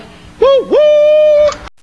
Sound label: woo